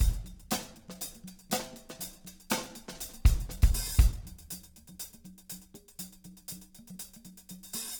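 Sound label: 120 breakdown; Big Fish Audio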